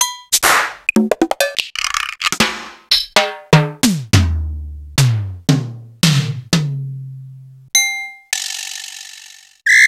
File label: Akai XR-10 Percussion